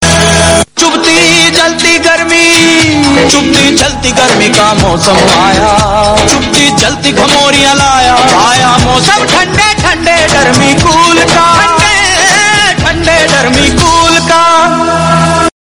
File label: song; dermi cool